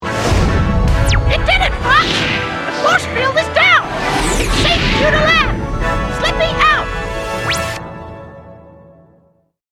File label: win